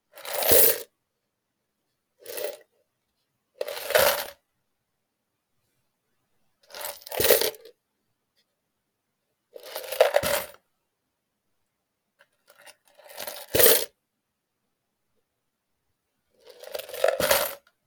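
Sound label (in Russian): монеты в пластиковой бутылке; zvuki zvona monet i kopeek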